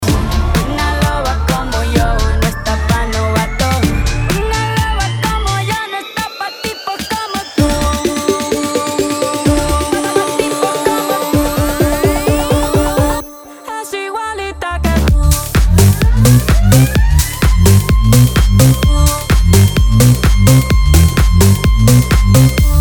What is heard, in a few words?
Remix bpm 128